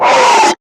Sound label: Screamer Pain; Warframe; Lasrian